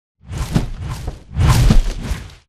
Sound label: flyer perched takeoff close; boneyard; Titanfall 2